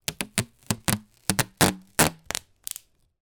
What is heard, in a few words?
sticky tape rip roll gentle; office; zapsplat